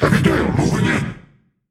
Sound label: Alarms Snowstorm; World Of Warships